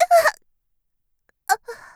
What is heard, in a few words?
die; Eternal Return; Tia; Voice Line; KOR